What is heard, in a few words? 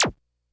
Bleep; Korg DS-10